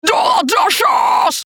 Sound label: dirty dish rage